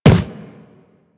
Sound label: Operator Run Wood, Footsteps; Warframe